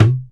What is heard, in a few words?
HI-TECH KITS; 90 sSampleCDs; Crypton - DrumBase5000; INDIA